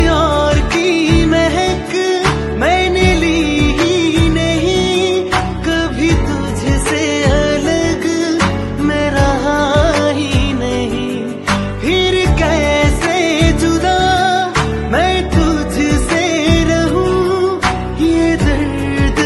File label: punjabi ringtone